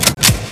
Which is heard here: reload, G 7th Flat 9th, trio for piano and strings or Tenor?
reload